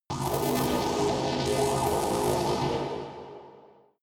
space machines work

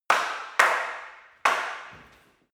staff wood fighting sequence short

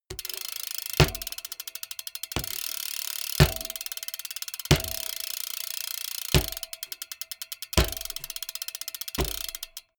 bmx bike freewheel hit

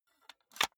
cassette case close soft

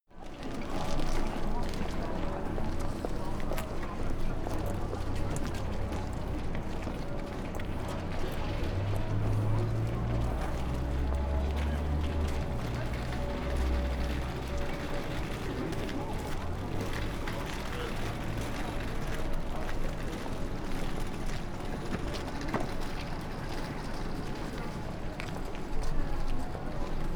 city winter germany crowded close

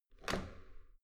metal door heavy handle up

